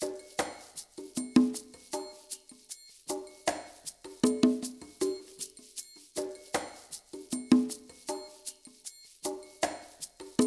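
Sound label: ICE PERC MIX, Liquid Grooves; Spectrasonics; Liquid Grooves 1 & 2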